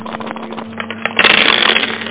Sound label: dice; Meeting Pearls Vol. II; GTI - Schatztruhe; psion; bangko